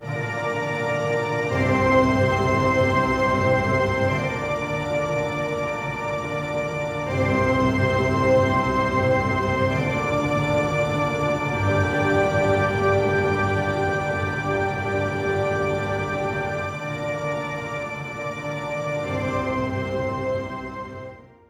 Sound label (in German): Orchester Verlaufsmusik E